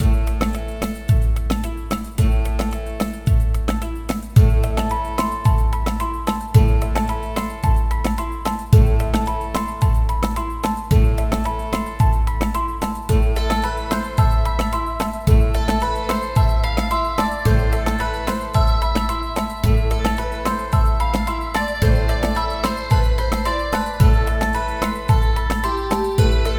arab

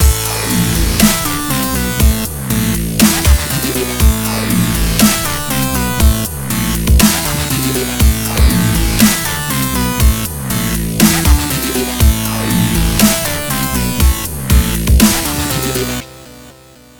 dub

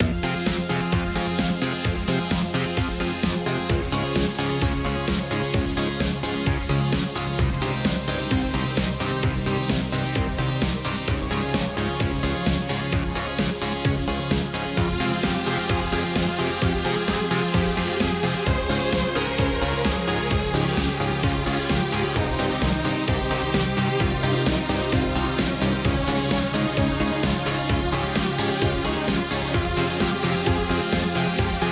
funky

